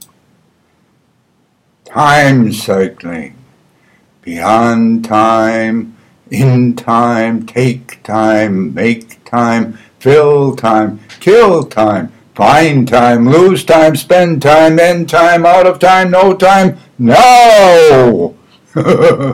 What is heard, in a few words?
Time Cycling